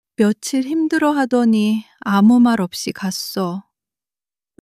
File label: crying; monologue